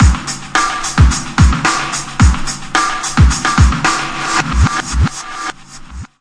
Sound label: hollow compressed, 108 bpm, drum loop; 101 to 110 bpm